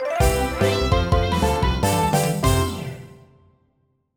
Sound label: Medal Box Upgraded!